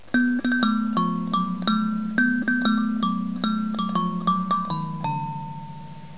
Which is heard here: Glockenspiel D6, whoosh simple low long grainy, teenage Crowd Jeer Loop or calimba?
calimba